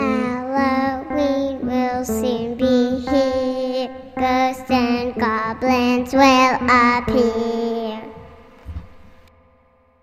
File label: Halloween Vocals